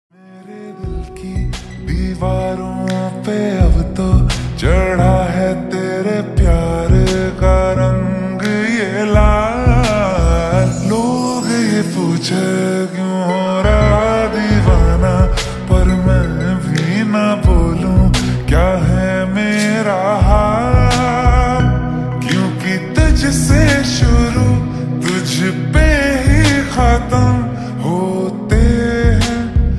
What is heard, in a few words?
Slowed Reverb Ringtone